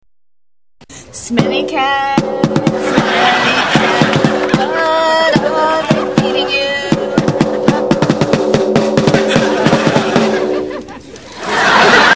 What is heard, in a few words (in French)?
tambour